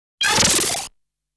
explosion sound; genshitou prehistoric isle

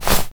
walk foley

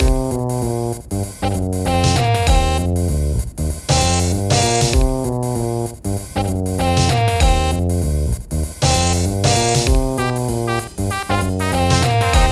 Jukebox Jazz; Minit

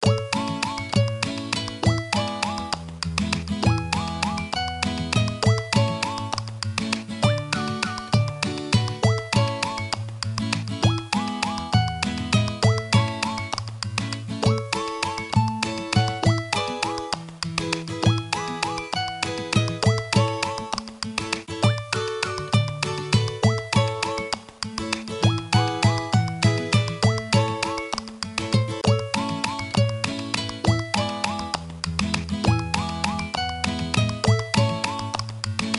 Main Music; Spanisch Buddy; NDS

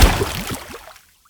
water death

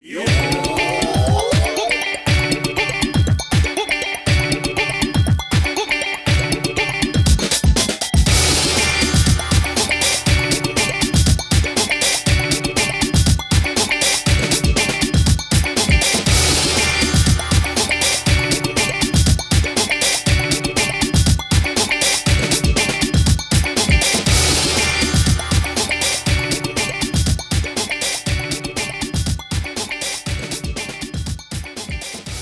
Jingle Result; Taiko no Tatsujin Drum 'n' Fun

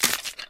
Bone Crack; doodle jump 2; android

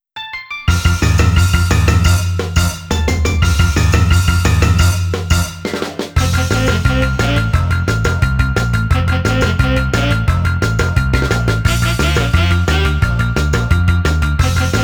Bright Rock 'n' Roll Instrumental; Rhythm Heaven; Frog Hop 2

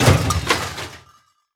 Crash Objects Small Metal

